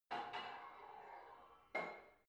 barbell heavy plate put on soft reverby, fitnessstudio; sport freizeit